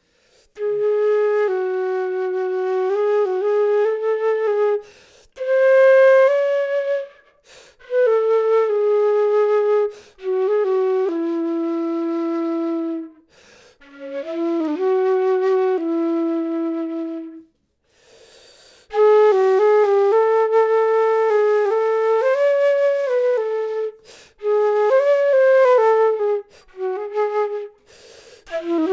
flute; logic

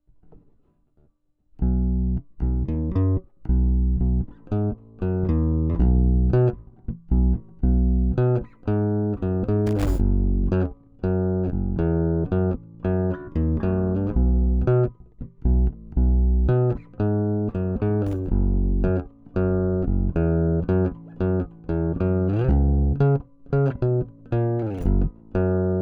Hi-Fi DI

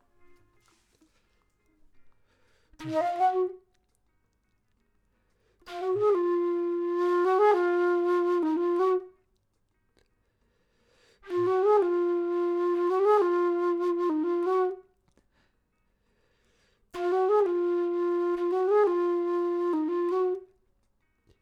flute; logic

